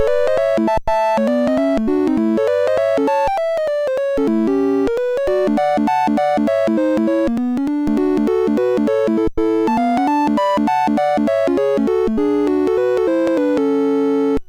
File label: sid MOS6581R3; Snokie